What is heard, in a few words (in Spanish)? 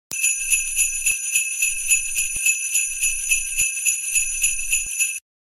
sonido cascabeles; navidad